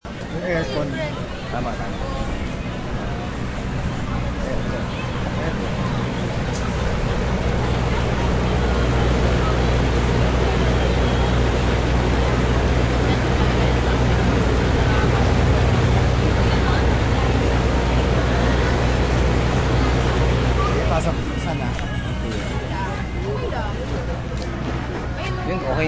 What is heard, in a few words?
aircon